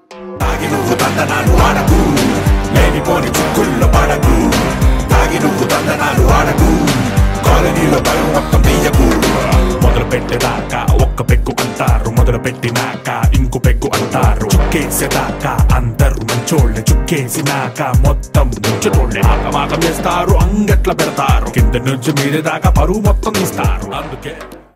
Telugu Ringtone

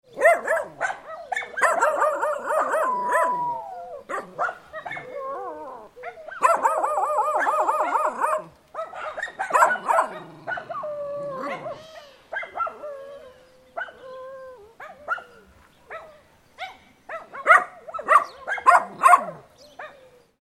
dogs noises

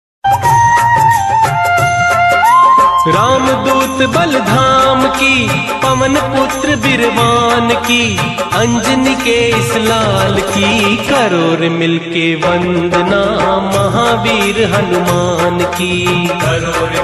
Hanuman Chalisa Ringtone; Mahavir Hanuman

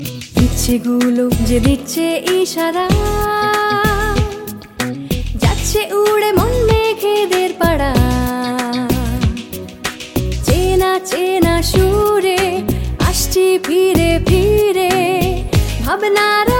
Bengali Song Ringtone

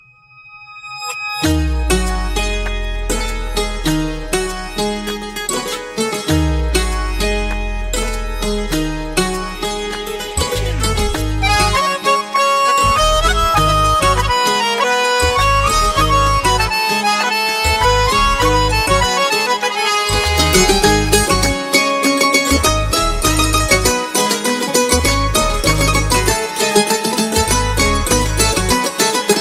Instrumental Tone

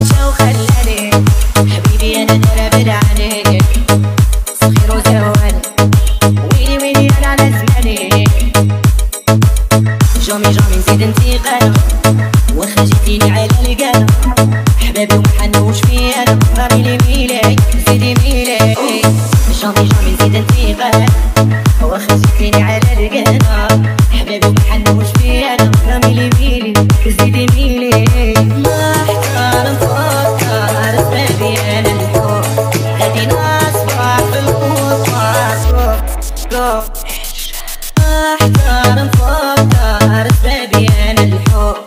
Ringtone

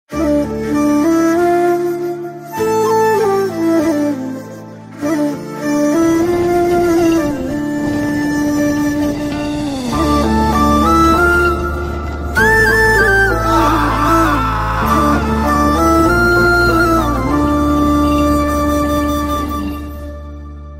Instrumental

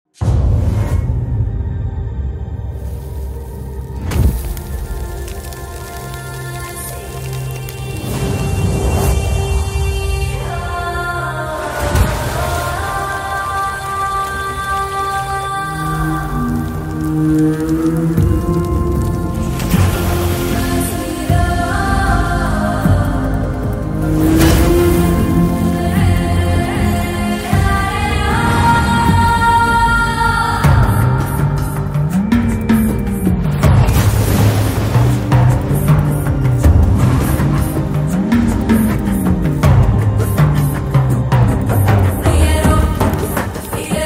Background Music BGM; RRR; Ringtone; NTR; Ram Charan; Ajay Devgn; Alia Bhatt

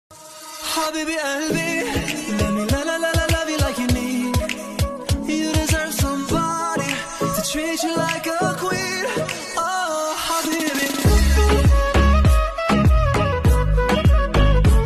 Best Arabic Tone

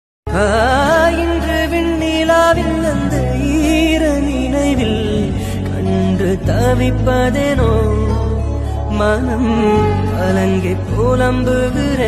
Love Ringtone; Alaipaayudhe

